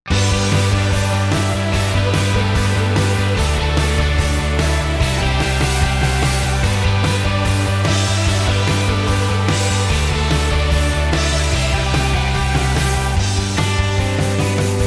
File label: indie comp